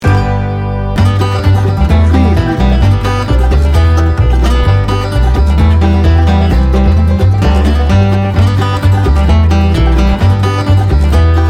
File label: bluegrass blowout no drums